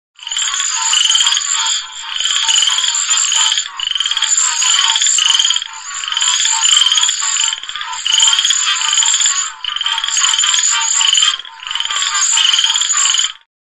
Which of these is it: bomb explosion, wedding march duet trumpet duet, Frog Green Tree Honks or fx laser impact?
Frog Green Tree Honks